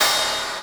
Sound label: One Shot Ride, Drum N Bass - Percussion; Sonic Foundry (Sony Creative Software); Knutrix Drum & Bass